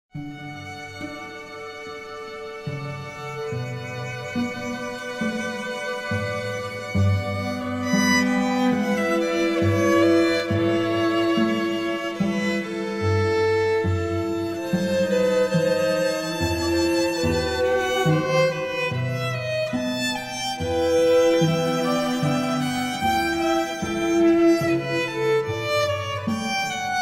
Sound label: Air on the G String, string quartet